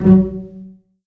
G3 PIZ F BAS, string; East West; The Ultimate String Collection

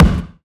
Acoustic Drums - Kick; Antidote Advent

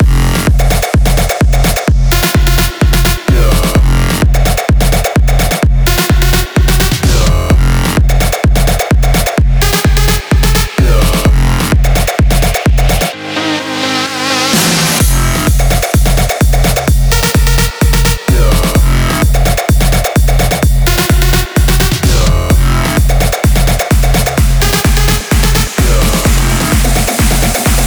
128BPM Mainstage Mix, Root Fmin; Vengeance - Essential Clubsounds Vol.5; Mainstage Kit

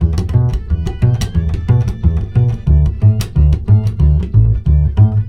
BASS, Balkanish Traditions

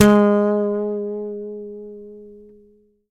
YAMAHA5 SUS G#3, Bass; Sampleheads; Will Lee Bass Library; YAMAHA5 MUTE

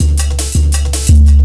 buzzsaw, Drum Bass Drumloops 165bpm; Zero-G; Total Drum Bass

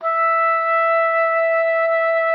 E5, OBOE; Xsample VOL-6

